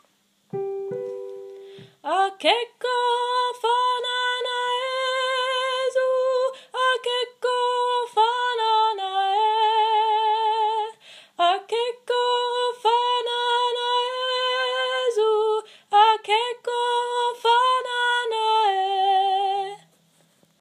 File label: Soprano